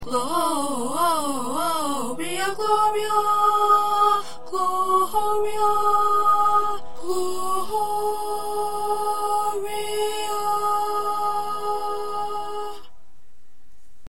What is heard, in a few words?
Low 1st Tenor